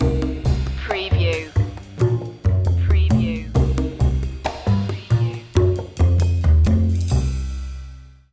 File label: jazzy opener